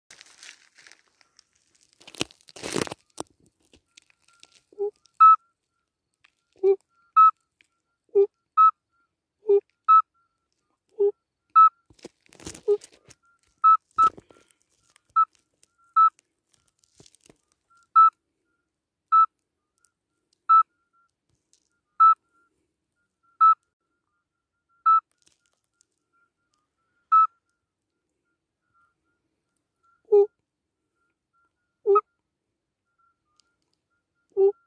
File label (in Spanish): sapo partero